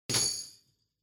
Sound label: Tambourine Drop